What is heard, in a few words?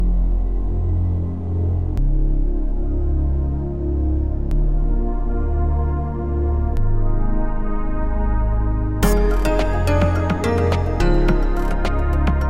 pad